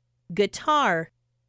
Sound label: guitar